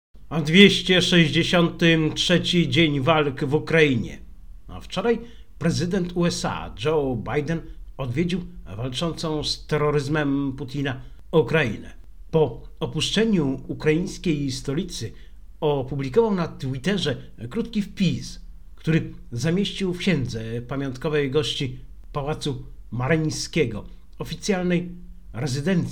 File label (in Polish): Hymn